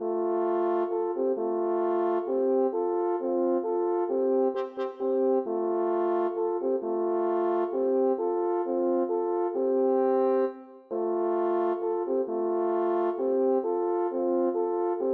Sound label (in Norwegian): For to horn